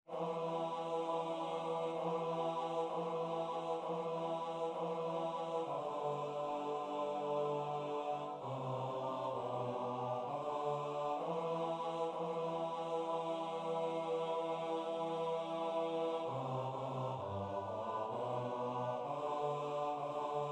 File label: bass